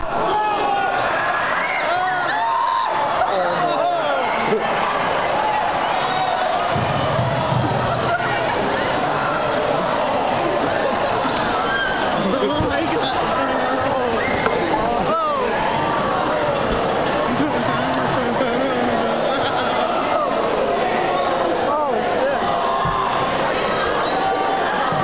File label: food fight